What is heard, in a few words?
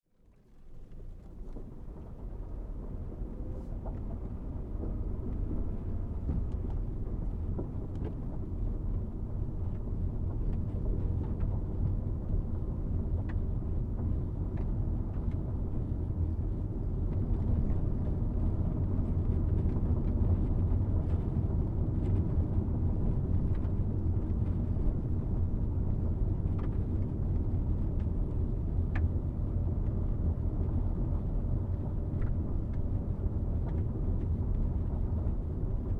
Car, Hatchback, Int Ride, Bumpy Dirt Road, Slow; Cars; BigRoomSound